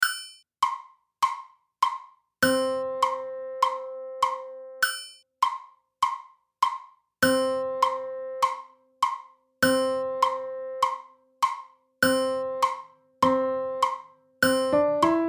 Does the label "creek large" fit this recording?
No